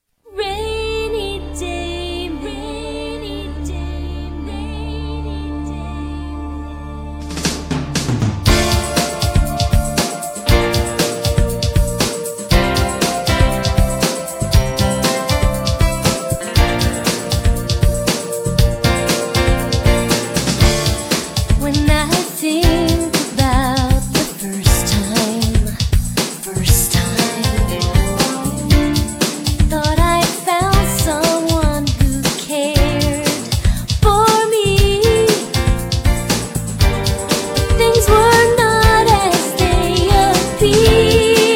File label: rainy day